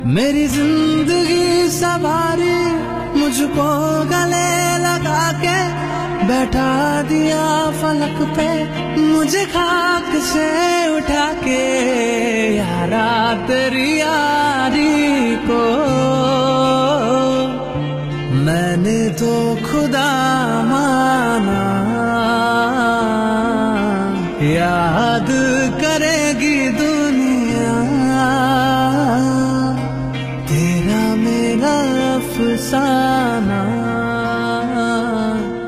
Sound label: Best Hindi Song Ringtone